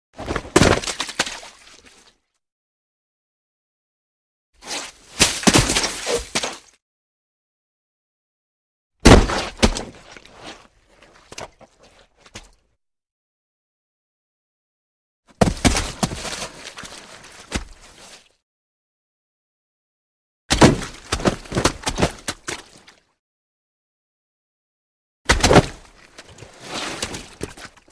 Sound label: Body Falls In Mud Heavy Body Thud In Mud With Splatt; Hollywood Edge Foley Sound Library